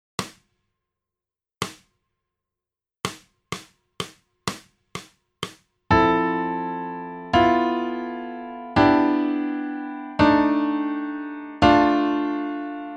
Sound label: jazz waltz